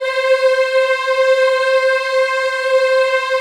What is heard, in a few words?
C4 WOM EH, Choir; USB Soundscan vol.28; Acoustic & Synth; AKAI